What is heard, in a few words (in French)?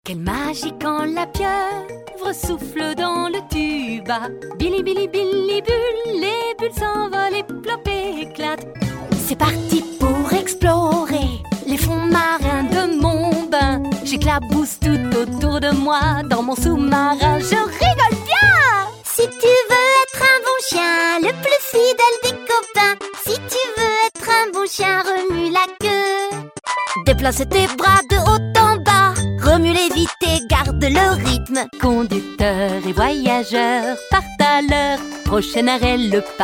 chant enfants; jouet vtech